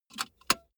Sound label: car bmw e46 interior safety belt insert clink